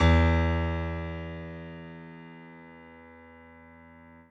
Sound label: bass piano